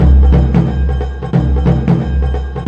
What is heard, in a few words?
090 bpm deep east, DRUM LOOPS and BREAKS